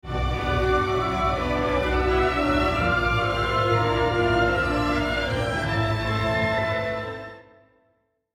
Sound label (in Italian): Logo sonoro; 23/2023 PLINIO BIMILLENARIO; pliny the elder and the matter of memory an encyclopaedic workshop